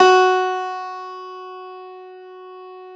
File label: Pop Piano ff -ped, F2; Classical Prepared Pianos; Total Piano